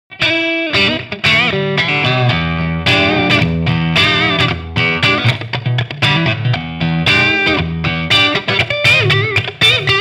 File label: Neck PU Crunch